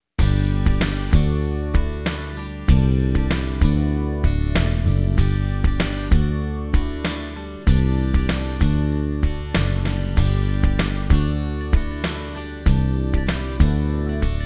theme; Georgia Wildfire Prevention

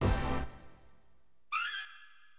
STAB, AcidJazz Funk Fx; LiveAct Pool

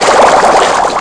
Bubbles; Sound Library 2000 - Wayzata Technology

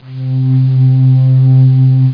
Warm Pad C3

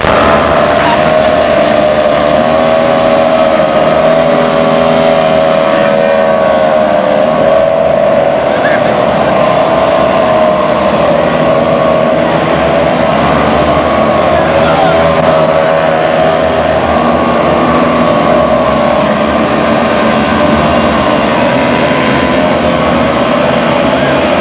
gokart, sound effects, vehicles; pinnacle; french

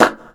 chpok

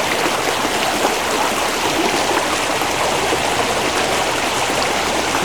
Water Loop; kult

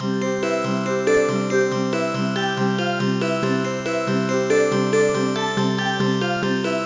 fill; PC Gamer IT CD 48; pong; Pong Demo; music; ice

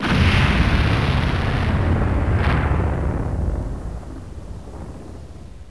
Mine

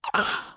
groan; Singles; French Version

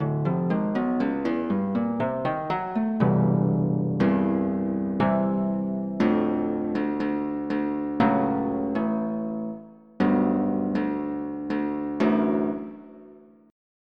Koto

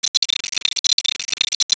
ant nearby; Army Men TiS

